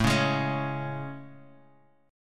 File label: A Major 7th A, Guitar, Half Step Down; AM7